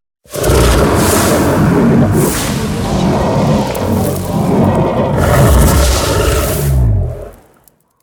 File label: dragon powerfull fire roar